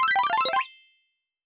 Special & Powerup; ArmyRaid; Interface and Item Sounds

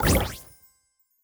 Special & Powerup; ArmyRaid; Interface and Item Sounds

